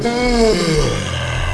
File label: gaeeehhhhn, irish pub